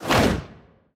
jump in; loki

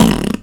fart

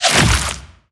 cage rolling stop

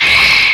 boss lite hiss

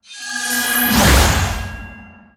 heavens light door

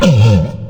maggot returns; pestilence

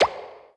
slipped rib deflect

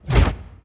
bighorn hand hide

